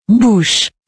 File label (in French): Bouche